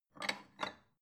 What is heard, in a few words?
bowl take